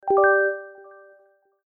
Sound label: pirorin